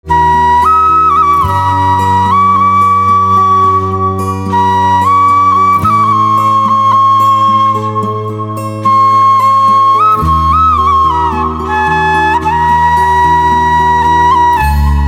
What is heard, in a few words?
Flute